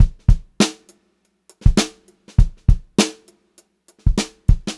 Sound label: VAR, Funk-Groove Drumloops